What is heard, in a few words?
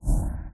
full screen start